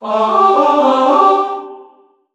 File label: Singing; Chained Echoes